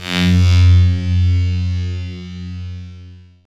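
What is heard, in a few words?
SYN, Roland, synth digital; Sound & Vision - Gigapack I CD 2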